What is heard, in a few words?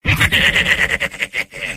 laugh evil; soldier; video games; source engine; tf; mvm